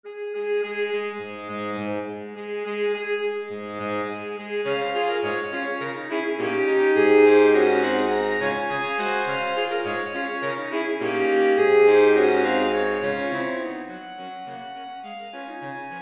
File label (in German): saxophonquintett